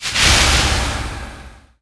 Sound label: umbra fire, weapons